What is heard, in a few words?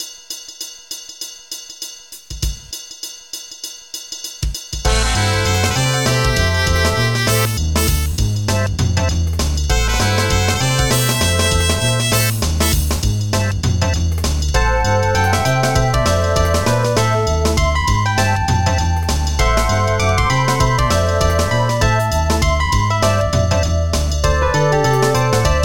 dive jazz